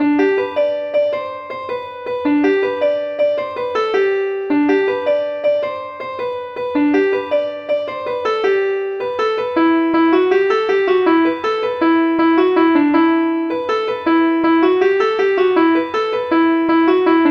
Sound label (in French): derobee guingamp